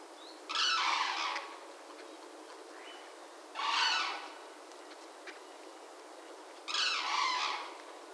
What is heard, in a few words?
Grus antigone